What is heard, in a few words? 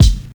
sharp hip hop kick drum single shot F# key; 1990s